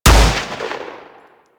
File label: Fire Shot, Weapons; Warframe; Gorgon